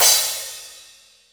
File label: Hats Real Open